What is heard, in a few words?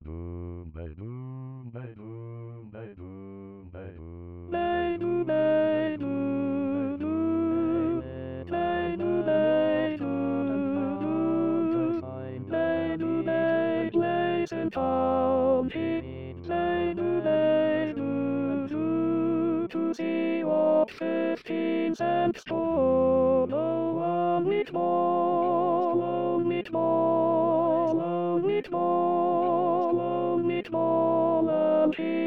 Tenor All Parts 120bpm